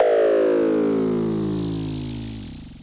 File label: FX, Dual Percussion Synthesiser; Klone